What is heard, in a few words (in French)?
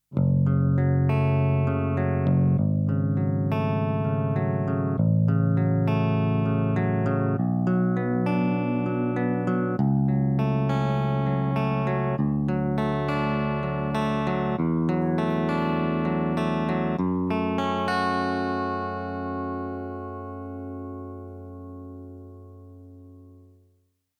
ARPEGE; Damien Elite